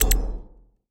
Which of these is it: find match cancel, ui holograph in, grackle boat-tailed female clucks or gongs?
ui holograph in